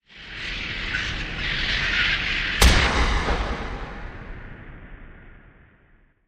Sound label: Course Rumble And Hard Impact Sweep